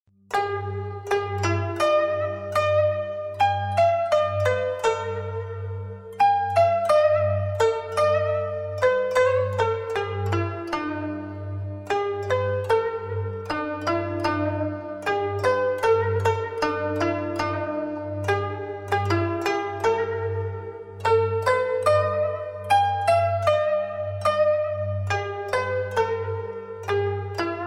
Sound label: Meditation